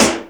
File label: SHOT GUN RIM; AKAI S6000 CD-ROM - Volume 4; Snare 2